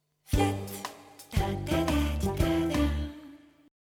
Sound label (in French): signature chantee